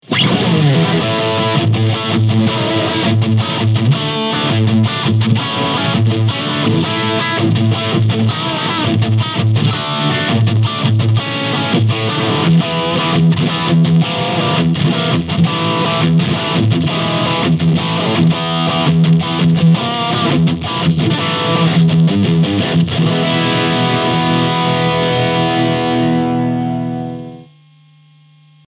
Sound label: RAW DAWG 60 EG Lead